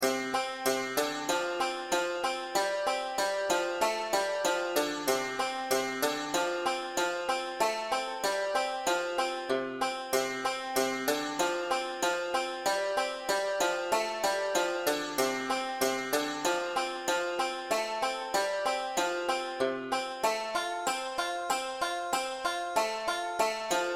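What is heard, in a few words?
brethren we have met tenor banjo f with melody; easy tabs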